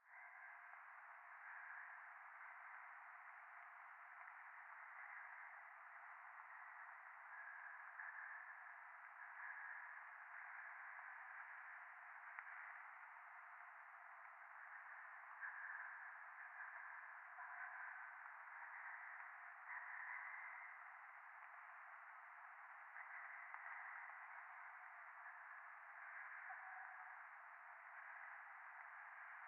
ゴースト 右耳快感吐息 雨

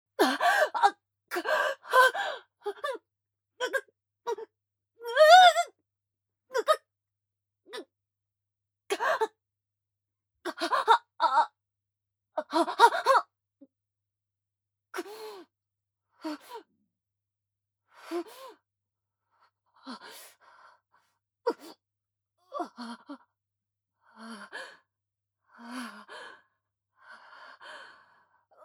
射精後の荒い息遣い; アスティリアに射精後の荒い息遣い; ヘレナ

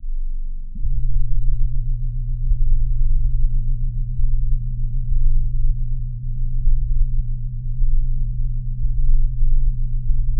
脳イキのスイッチ「軽」「23Hz7θ30Hz」

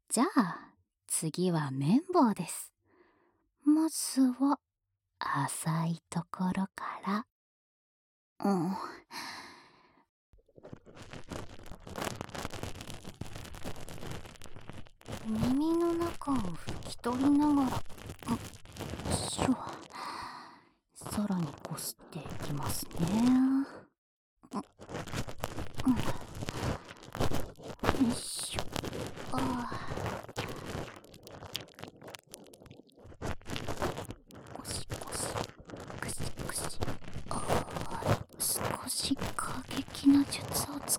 綿棒, 左, ASMR

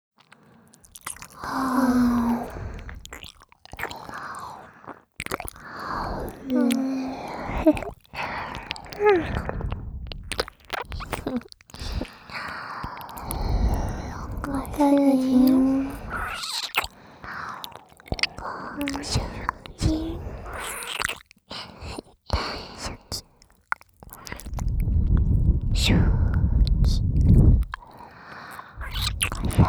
両耳舐め; ディープキス＆耳舐めオンリーオナサポトラック

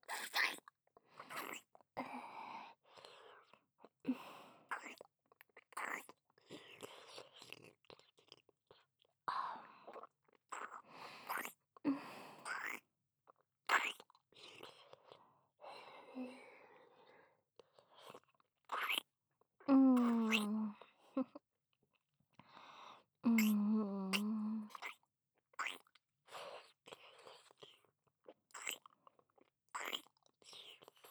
キス, ASMR; アドリブ集

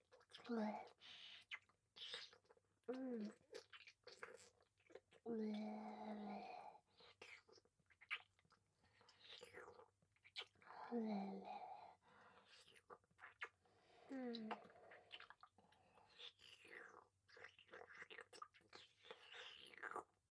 フェラ 左

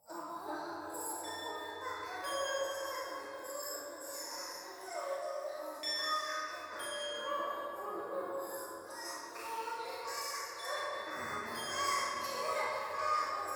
教会 低音喘ぎ 寺

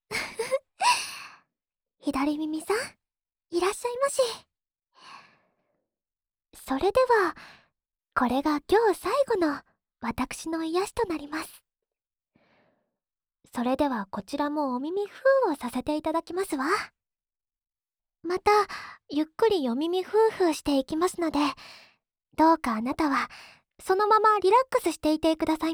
吐息いっぱいお耳ふーふー(左), ASMR

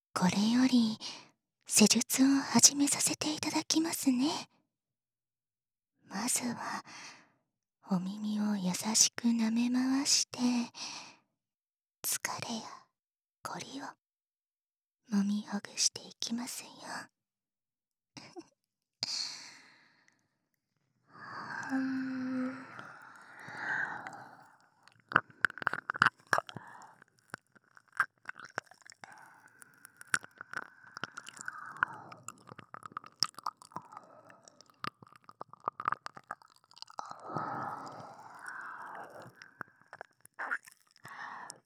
耳舐めパート, ASMR

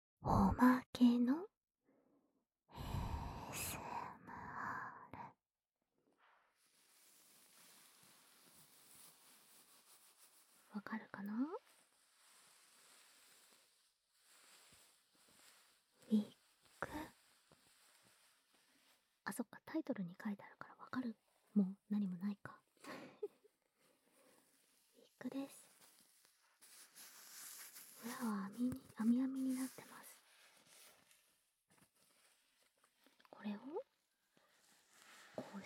ウィッグを梳かす

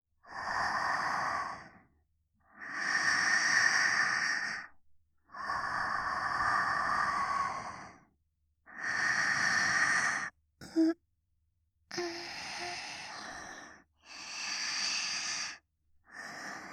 寝息; SEあり

